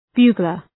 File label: bugler